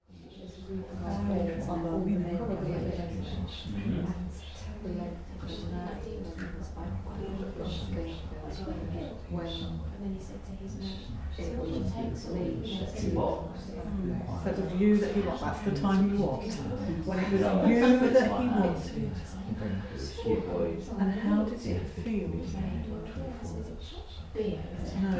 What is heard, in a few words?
Crowd Interior Mixed Chatter Atmosphere 2; Mixed light background chatter; about 10 people; good office buzz; Crowd Sound Effects